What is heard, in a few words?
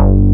SYNT BAS G1; Big Fish Audio; Synth City